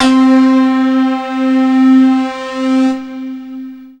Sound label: BELL SYN; SoundFactory; SMPMANIA